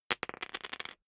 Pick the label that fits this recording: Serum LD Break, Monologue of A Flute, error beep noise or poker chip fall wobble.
poker chip fall wobble